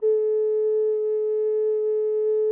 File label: GEMSHORN TEN A; SoundBytes - Early Patches